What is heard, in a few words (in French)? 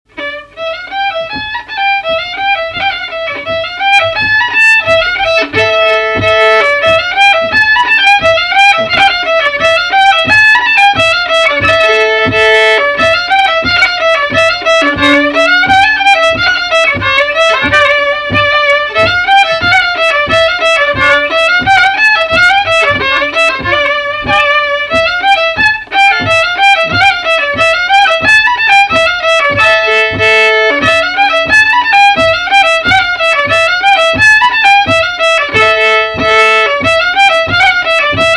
Marche de noce; Marche de noce de l Anglars